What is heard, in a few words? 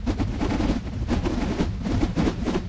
Abyss Tendrils Loop